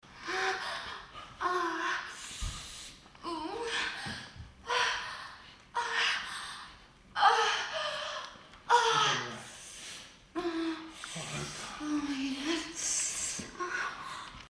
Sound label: sex, moans; The Witcher Hunt